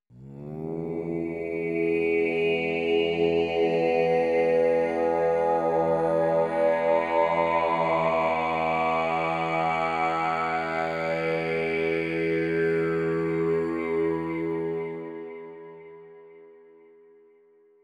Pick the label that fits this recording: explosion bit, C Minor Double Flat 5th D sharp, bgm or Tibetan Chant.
Tibetan Chant